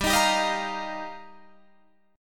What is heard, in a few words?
G Minor 7th Sharp 5th, Guitar, DADGAD; Gm7#5; strummed